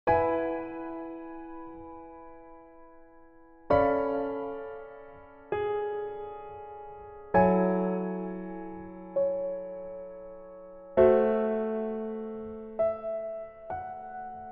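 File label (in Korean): Piano version -1키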